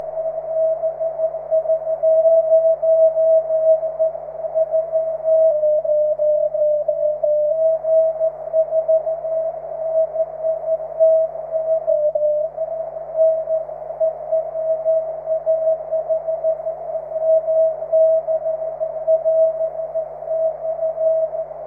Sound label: cw, 23cm